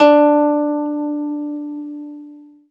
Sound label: ZITHER, Alpine Volksmusik